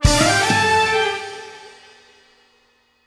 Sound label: Disco Stinger Stab; Plants vs. Zombies Battle for Neighborville